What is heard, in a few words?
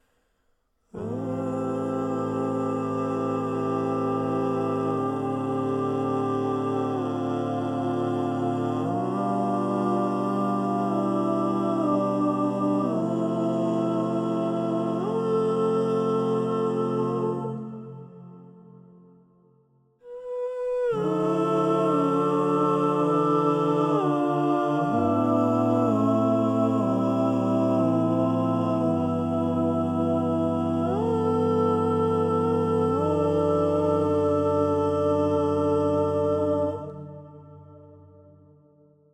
choral; heroes of nerevelon